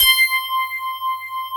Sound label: FM ELP C5 R, Keyboards Old School; USB Soundscan vol.09; AKAI; FM ELP 7